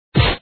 Hit; pencak silat 2002 gamerip online

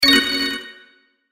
SFX New Skill

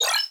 Fight Sparkle; Boomerang Fu

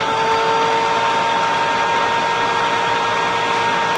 OnAttack

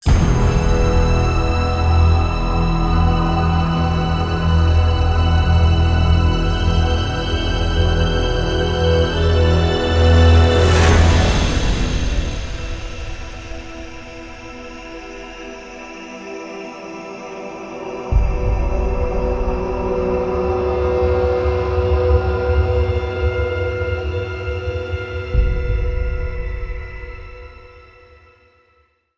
Mission Failed; Call of Duty Modern Warfare 2 Spec Ops

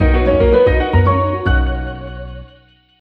Home Menu Banner; Picross e collection 2011-2018

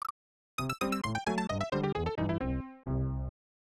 Miss; SMW Remade From Memory 2021

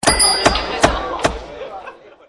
Click; Auction

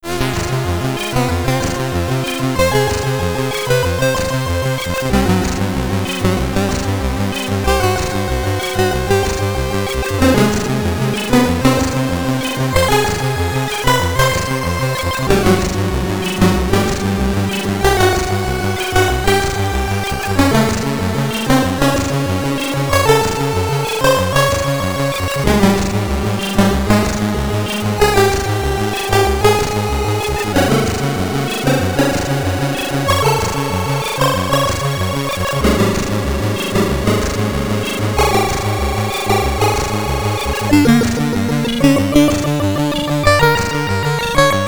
Boss Theme (SID Stereo); menace commodore 64

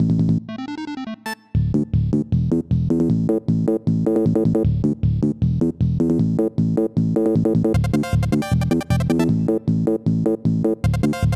TV Commercial – Exercise Machine loop; animal crossing new leaf welcome aamiibo soundtrack

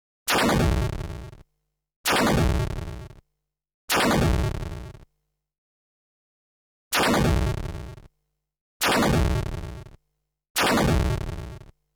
Destroy a House; string quartet

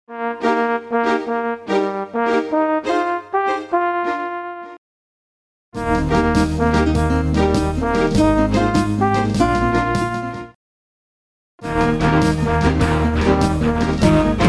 Victory Flourishes; Swords and Sandals 2

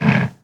Monster Cow type Death

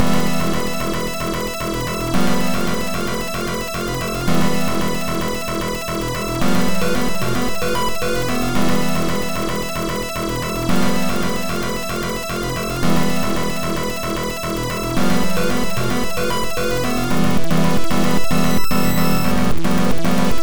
RPG - Battle

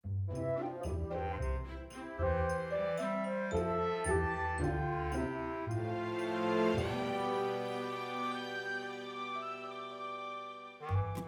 Snow Theme Bgm; My Little Pony Magic Princess

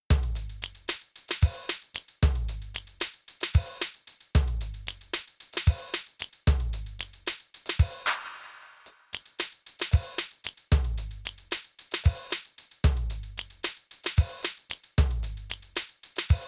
Tambourine; Kaiki Tambourine; kuro no juusan gamerip

